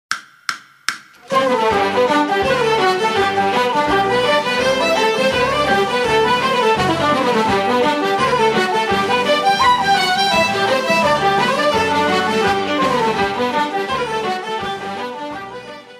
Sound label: Josephine Keegans Hornpipe